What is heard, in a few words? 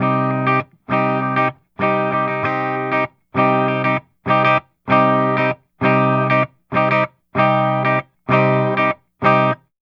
098 Pop Guitar Strum C min, Electric; Pop Rock; Electric 055-099 bpm; Pro Tools Essential Loops; Digidesign